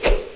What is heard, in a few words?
swing